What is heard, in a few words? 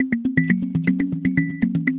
Floating, Alcatel phone tones; OT-757